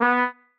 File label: marimba trombone; ゆめ2っき